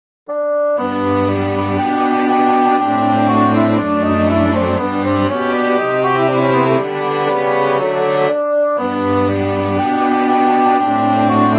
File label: Quartet